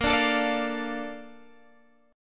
Bb Major 7th, Bb, down, Guitar, Standard; BbM7 strummed; JGuitar

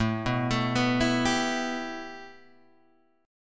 A 7th Suspended 2nd Guitar Open G

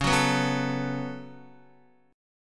Db 7th Suspended 4th Sharp 5th Db down Guitar

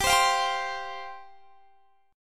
G 7th Suspended 4th G; G7sus4 strummed

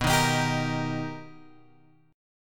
B Augmented Major 7th B, chord; B+M7 Chord {7 6 X 8 8 6} strummed

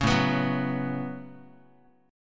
A 6th Add 9th A down Guitar; A6add9; strummed

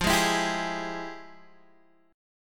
F Minor Major 9th, Guitar Open D, chord

